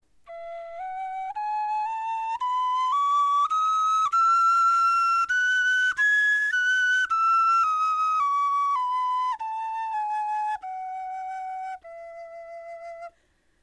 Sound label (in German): tonleiter, knochenfloeten